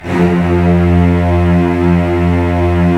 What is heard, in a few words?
STR VCSF, Roland LA Composer; Roland - LA Composer vol. 4